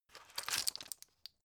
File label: drug pull out hard; berufe; arzt